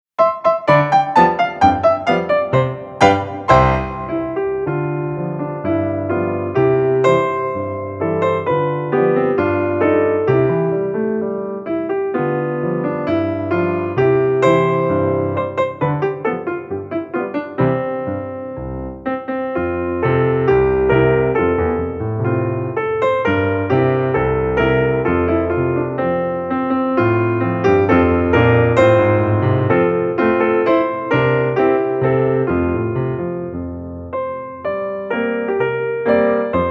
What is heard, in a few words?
solo piano